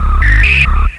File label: JUNO Hard Sound; Urban Shakedown